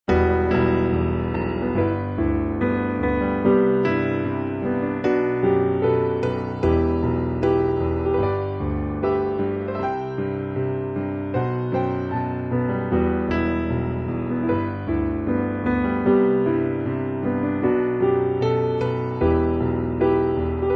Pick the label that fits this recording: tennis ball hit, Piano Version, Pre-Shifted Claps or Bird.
Piano Version